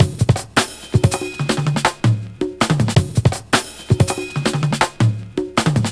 162, Jungle Breaks; Format; Jungle Warfare 1&2

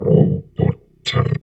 VOX VOX, Electro Vox

